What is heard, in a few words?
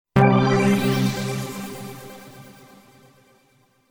Power Up